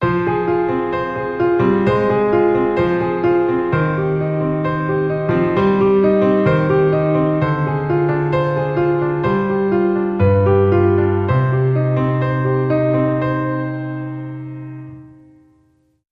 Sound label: Gentle Flow Piano; 15sec